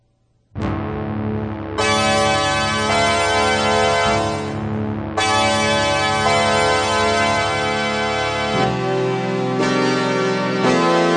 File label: DX7 - 5th Horns- P600 4th horns